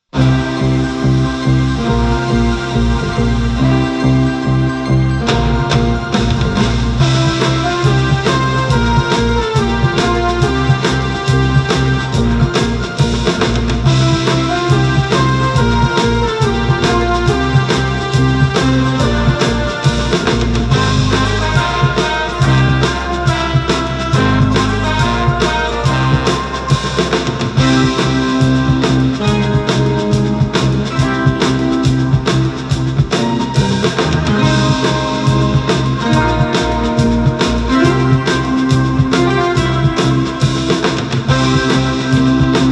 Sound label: Cassette Music